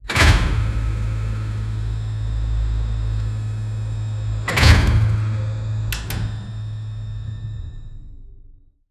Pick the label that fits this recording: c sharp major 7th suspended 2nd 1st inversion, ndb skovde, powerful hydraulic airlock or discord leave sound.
powerful hydraulic airlock